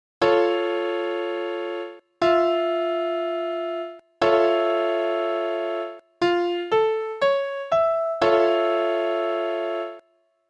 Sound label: Aug Maj7